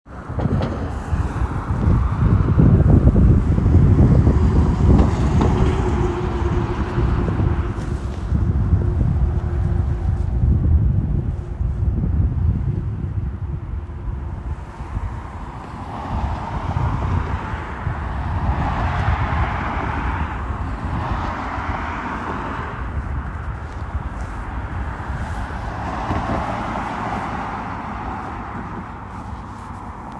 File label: Passing cars